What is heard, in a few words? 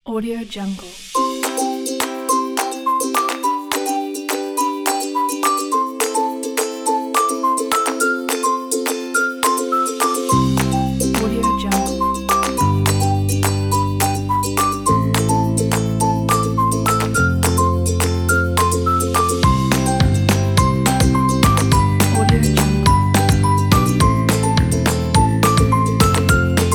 happy